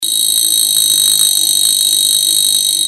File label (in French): réveil